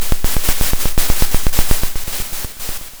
Sound of a drum roll

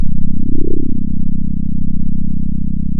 pop, sound of a small motorcycle

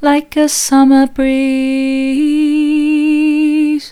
like a summer breeze soft, vocal

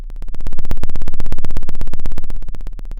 Sound of a plane taking off

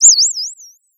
Sound of a bird tweeting nicely

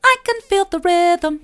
i can feel the rhythm mid, vocal

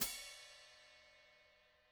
room Pd Hat, drum

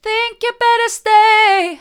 mid, vocal; think you better stay

